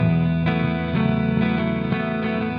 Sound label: Happiness Rhythm Guitars; ACID MUSIC